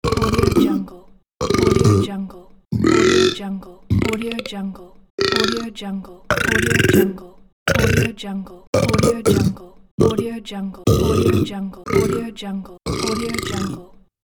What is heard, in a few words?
burp mega pack